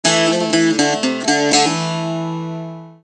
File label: Bouzouki